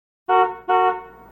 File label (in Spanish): coche claxon